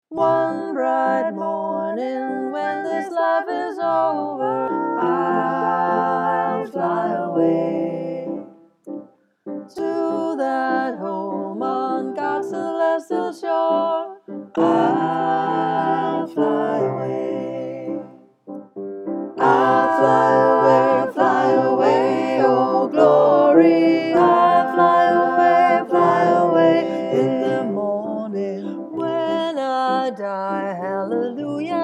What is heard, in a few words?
tutti